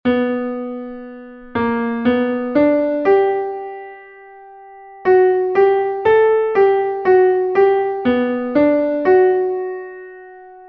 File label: classical piano